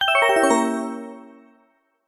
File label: cartoon descend magical mysterious fast bright warm musical